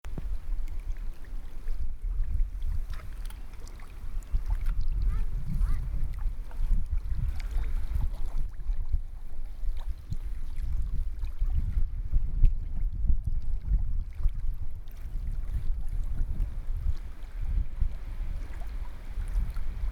wind water distant voices; evan levine; fieldrec

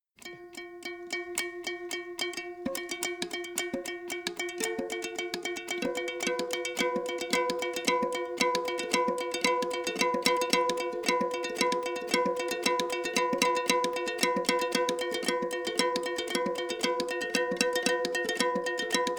Call of the Cicada; Tboli Musician

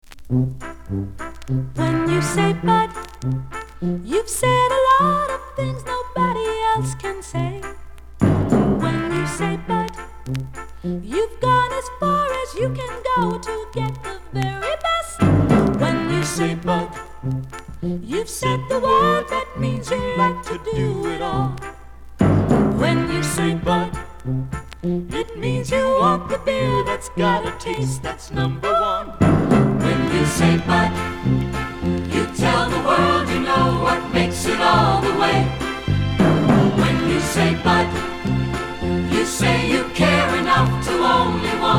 Full Vocal

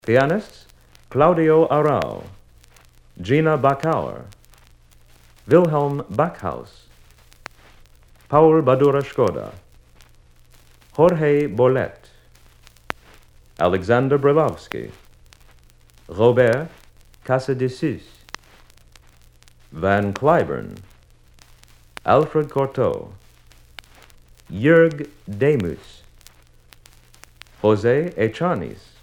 Pianists

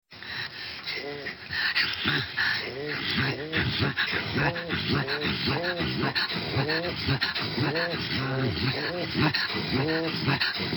Inuit Sample

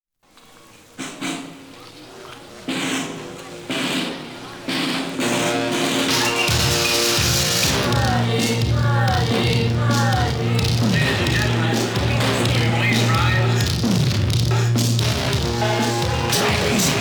Drag Racing

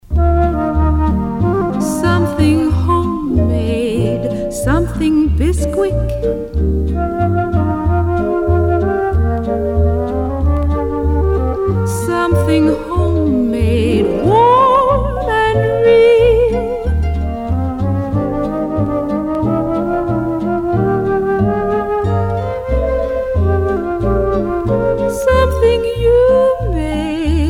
Jingle; Lorin Frank Productions; Bisquick

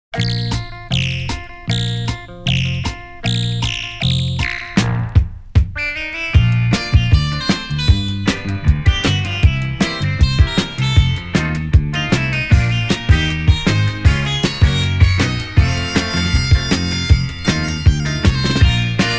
Many Moogs; Many Moogs of Killer Watts

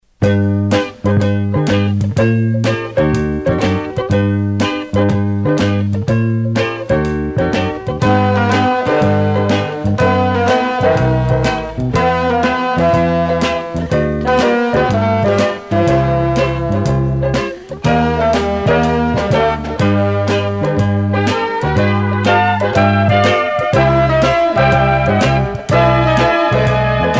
Ad Music

